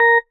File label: marimba tissue; ゆめ2っき